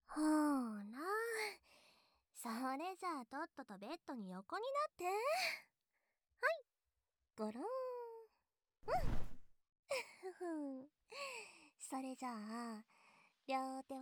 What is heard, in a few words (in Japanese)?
耳舐め ローション手コキ; 耳舐めでチンイラ誘惑、ローション手コキでドピュ♡